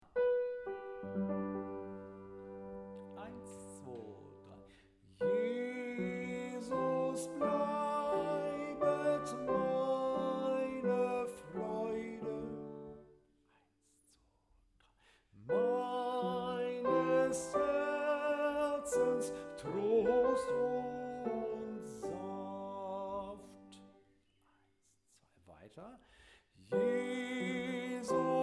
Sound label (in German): sopran; Jesus bleibet meine Freude